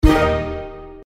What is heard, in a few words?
scatter 3; Horoscope